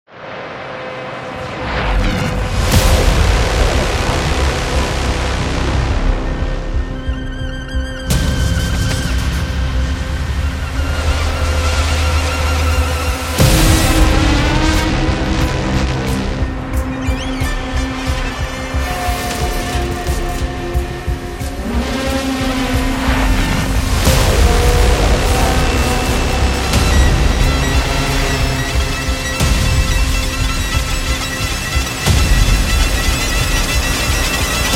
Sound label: Intense Horror